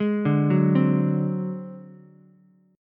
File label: D 7th Flat 5th D down Ukulele; D7b5 arpeggiated; JGuitar